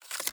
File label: battle preparation exit